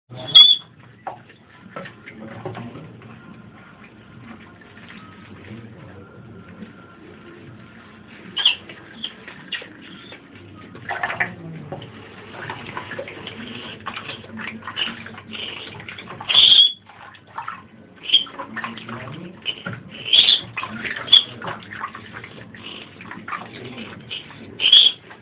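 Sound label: pisk krysa, rat squeak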